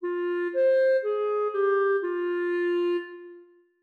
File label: 5 notes F min